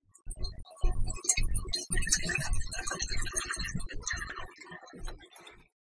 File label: Synth Liquid Severel